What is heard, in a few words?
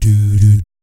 "DOO DOOT", vocal jazz fx